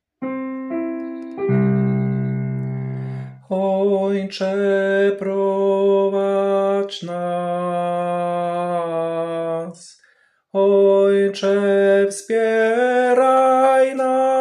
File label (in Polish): tenor; Ojcze prowadz╠u nas; nagrania glosow